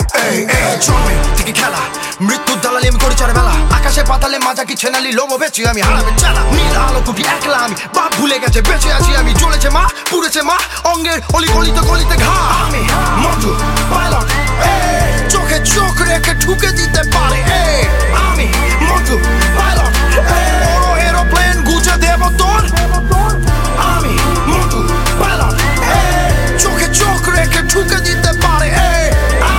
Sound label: Ringtone